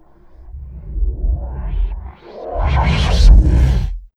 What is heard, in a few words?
CHILD, ORGANIC; USB Soundscan vol.33; AKAI; Disjointed Textures